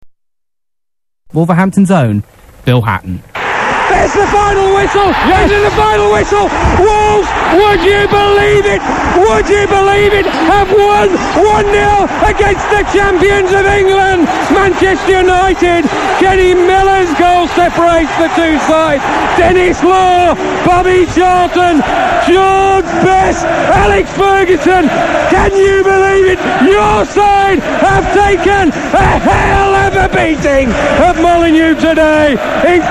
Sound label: final whistle; Bill Hatton